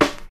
Old School Smooth Snare C# Key, Royality free snare one shot tuned to the C# note